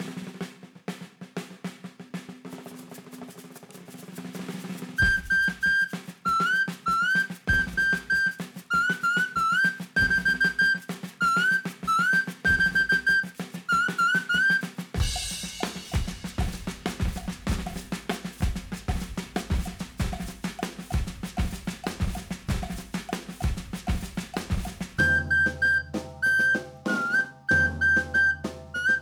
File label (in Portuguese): Playback exercicio